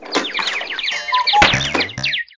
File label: Cuckoo Hit; talking tom cat 2 android ios online gamerip 2011